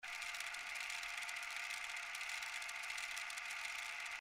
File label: reel spin; slots; Fruit Fiesta